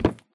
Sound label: wood step; Golden Crayon Sounds